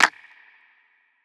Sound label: clap; eddyflux; dough-samples